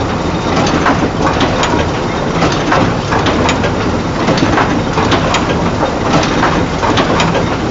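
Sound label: ambience industrial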